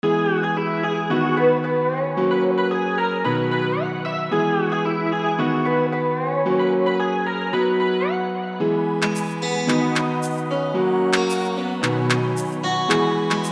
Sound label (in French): rythme, beat; Allscom Challenge; Beat de la Semaine; beat exclusif du challenge